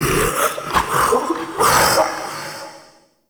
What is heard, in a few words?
Mockery Male Vocal Stand; Characters; Enemy; Mockeries